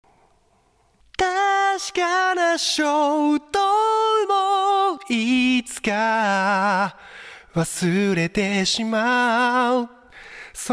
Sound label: vocal contour